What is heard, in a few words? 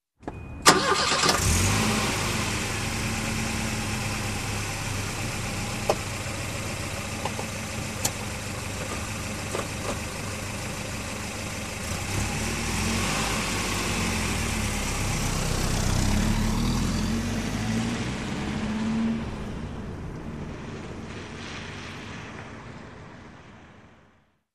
Engine Start Drive Away